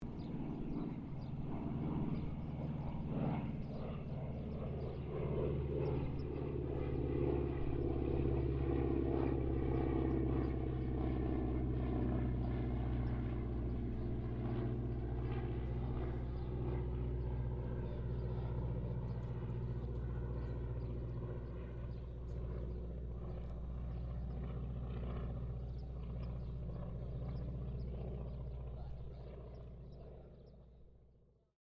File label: AIRCRAFT CAC CA-25 WINJEEL PASS-DISTANT COMMS, fighter trainer; RAAF Winjeel fighter trainer; Airplane Sound Effects; Airplanes (Sound Effects:Vehicles)